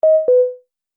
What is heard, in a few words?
Multimedia Event Notification, beep sound effect